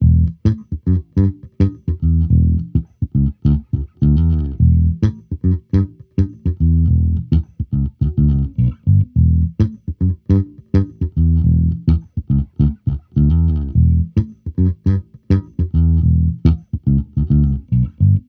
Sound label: Amped loop 105 E, Electric Bass Loops; Slate Digital BASSHOLE Sample Pack